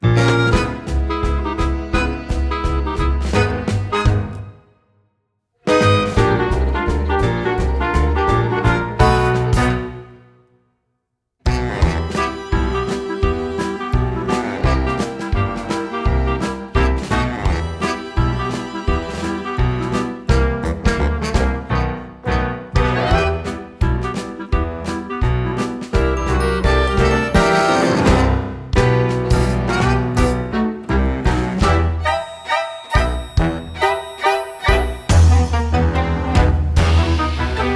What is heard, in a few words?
Accompaniment